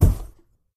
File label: Fall Impact Generic